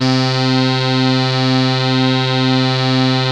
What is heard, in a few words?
ODY PAD, ARP Odyssey